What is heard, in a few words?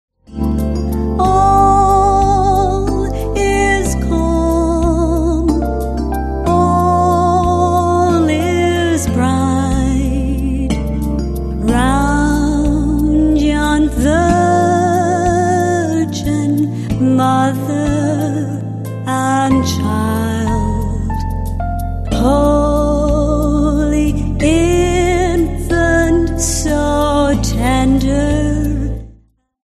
jazz and jingles silent night